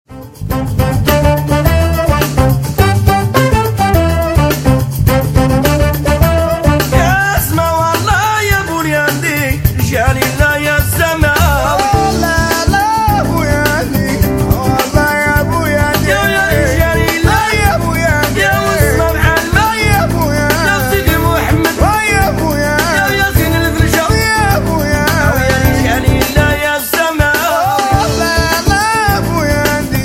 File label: Percussion, GNAOUA D’ESSAOUIRA; FESTIVAL D’ESSAOUIRA2015; モロッコのエッサウィラ; グナワ系参加者達のセッション